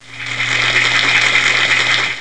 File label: applause; palace